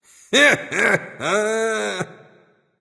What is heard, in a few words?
heh heh; smite